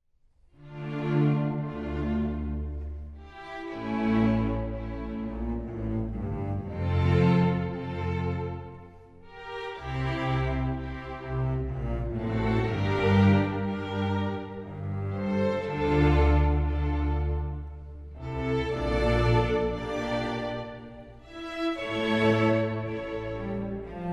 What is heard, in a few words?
Harpsichord Suite No. 4 In D Minor, Sarabande; HWV 437